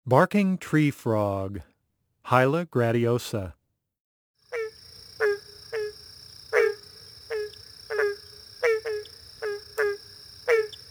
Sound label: Barking Treefrog Call